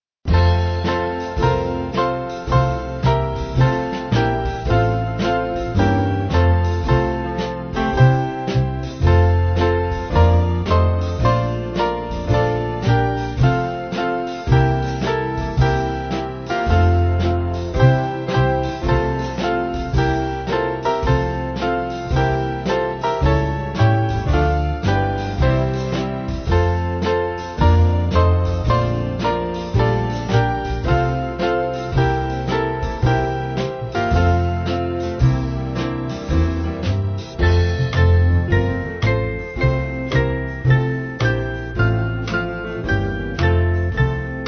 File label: Band